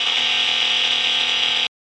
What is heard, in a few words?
scary glitchy sound